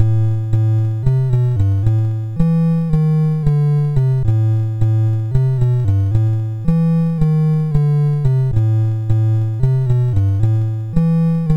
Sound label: MOS6581R4, sid; Nibbler; hvsc